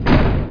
hvy stop; outlaws

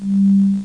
baloon move; PLANET X

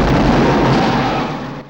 EXPLOSE

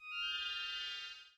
Sparkle; Thinkin' Science; EdMark

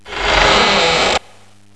creaky; Enciclopedia Del Perro

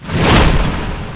WE HIT; cybrdome

